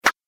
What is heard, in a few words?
Face Punch; Punch And Hit Sound Effects; combat